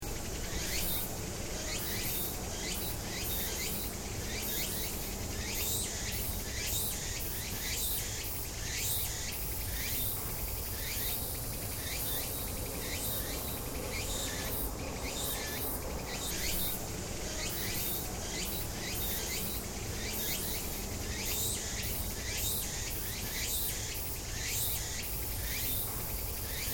afternoon cicadas, Tokyo Japan; Afternoon cicadas on the outskirts of Tokyo, Japan, in September; Many cricke; Cicada Sound Effects

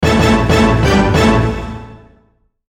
Logo Big Orchestra Hits; Sonic Logo; Blastwave; Sonic Logos; Production Element Imaging Element Accent Transition

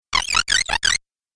Cool Fx Video Game; Twistedtracks

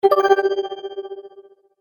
Magic Purchase Vibrate Ding; Cartoon Sound Design Sound Effects

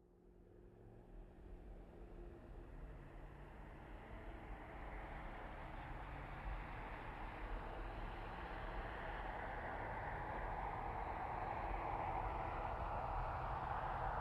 Truck GMC Savana By Corner Med; 2010 GMC Savana; Trucks; Vehicles

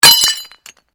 GLASS SHARD SMASH; Material components for constructing more complex sounds

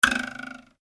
comedy ruler twang; Comedy / Cartoon Ruler Twang; Funny Sounds

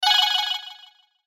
Chime Trill; Chimes (Sound Effects:Multimedia)

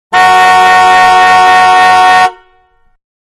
Big Car Horn Long Exterior; Cars (Sound Effects:Vehicles); Manhattan Production Music

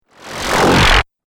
Laser Suck; multimedia

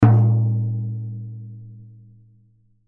Tom Drum High, Tom Drum High Hit Rattle; Drum Kit Sound Effects; Blastwave

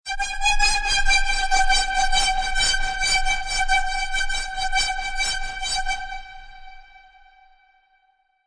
Evl Hi Tensn, High evil tense sound.; Scary Tones; Horror; Evil High Tension

